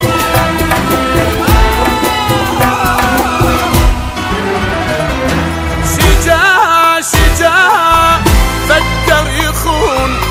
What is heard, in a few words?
song ringtone